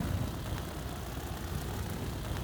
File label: ship engines; World Of Warships